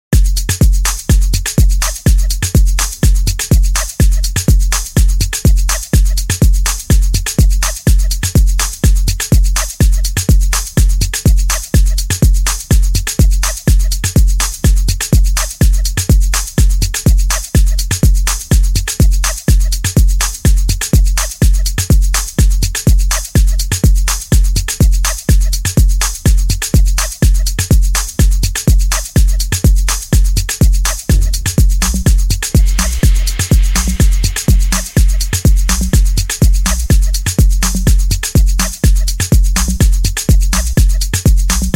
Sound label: Remix